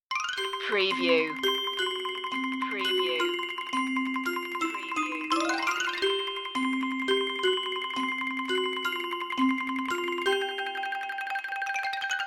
pitched perc only

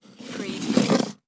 Object Slide Table

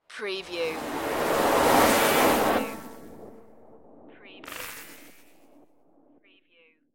dimension power glitch

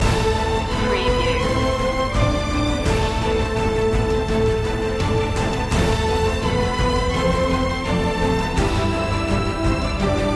celtic heart no choir

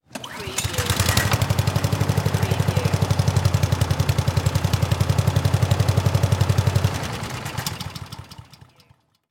Fire Truck Fan Pull

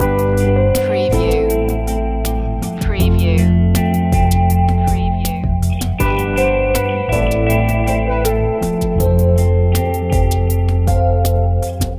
chill out loop

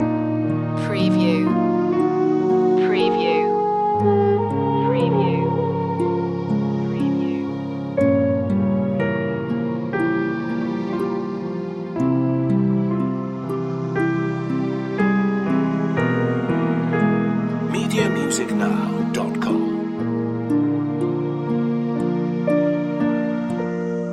sad and black loop

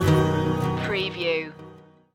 summer bike ride stinger

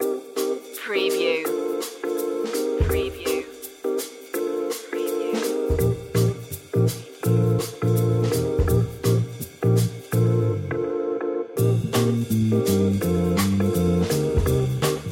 scooter ride loop

